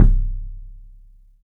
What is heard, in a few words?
THUD KICK; 3D Drums